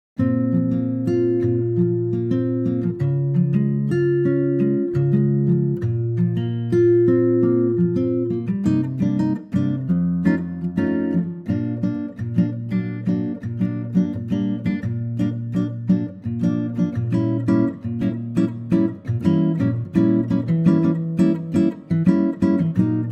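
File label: Ac Gtr Bb, male; key - Bb - vocal range - F to Bb; Wonderful Acoustic Guitar arrangement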